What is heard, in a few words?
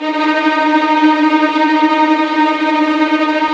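strings tremolo, violins trem; Roland LCDP13 String Sections; STR Vls Trem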